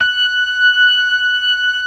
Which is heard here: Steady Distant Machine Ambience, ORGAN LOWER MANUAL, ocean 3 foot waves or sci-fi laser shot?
ORGAN LOWER MANUAL